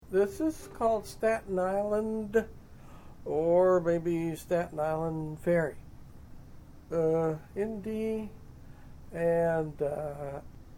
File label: Fiddle chords, Irish